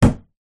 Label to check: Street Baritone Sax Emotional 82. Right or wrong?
Wrong